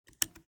lamp switch; Toybox

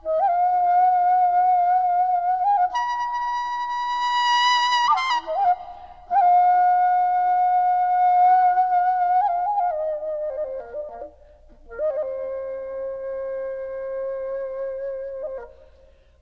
daegeum; Korea; chung sung gok

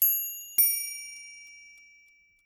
chime, stopwatch

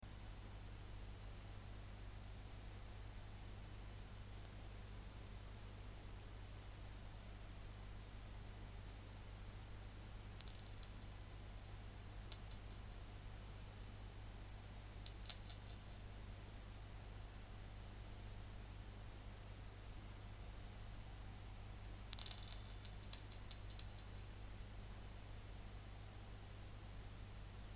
electronic interference

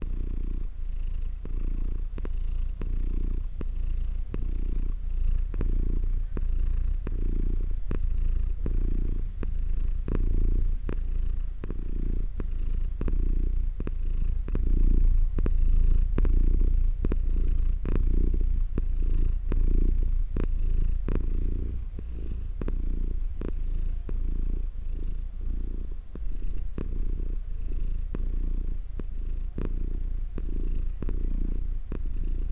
Cat Purring Deep Low Snor; Toybox

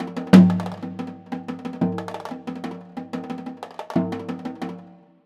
janggu; Korea; Instruments

